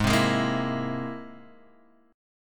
C Augmented 7th G sharp

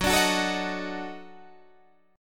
D sharp Minor Major 7th Flat 5th F sharp

